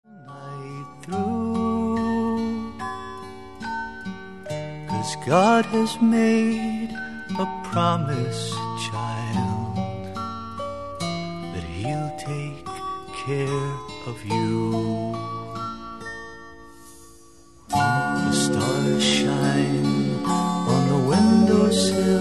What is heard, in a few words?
lullaby